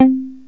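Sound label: C3 muted guitar; proteus1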